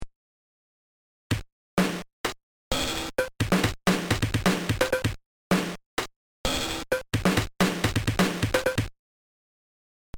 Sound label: Casio CTK 150 kits for LSDJ